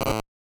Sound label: hit